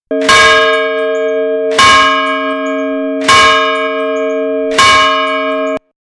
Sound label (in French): cloche; Châteauneuf